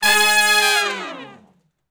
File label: Long Falloff (Ab) unison, Horncraft; Sections